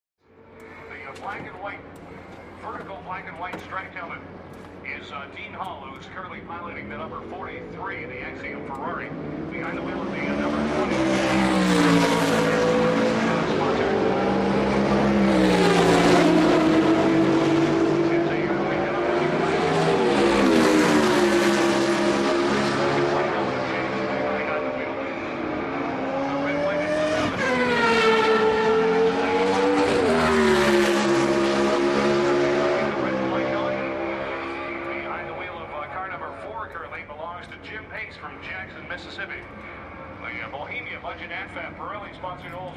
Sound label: Sports Car Race Pit